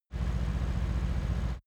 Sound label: car mercedes amg c63 s idle